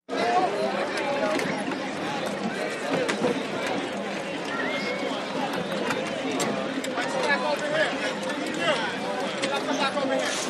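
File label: Central Park Ambience Lake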